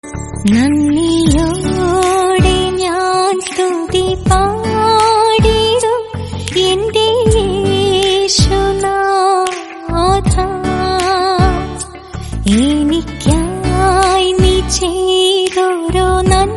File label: malayalam song